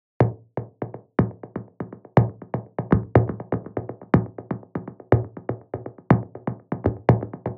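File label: 122 BPM Rhythm Impacts; mmb studio melodic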